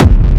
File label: Techno Kick; 30 Techno Kicks 2025; Ghosthack